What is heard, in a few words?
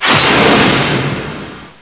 draw sword